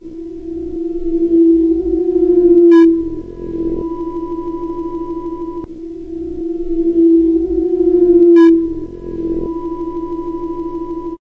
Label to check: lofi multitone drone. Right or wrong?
Right